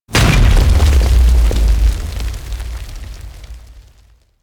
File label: Body Slam; Enemies; Abilities; Warframe; Corpus; Arachnoid Camper Terra